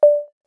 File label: Container Touch; finn's sounds